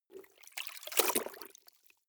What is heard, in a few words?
clothes water updown soft, haushalt; haus garten